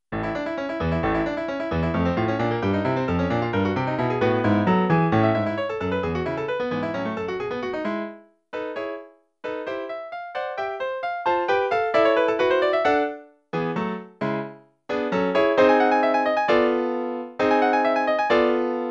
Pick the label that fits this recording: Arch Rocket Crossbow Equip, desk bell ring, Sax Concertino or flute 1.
Sax Concertino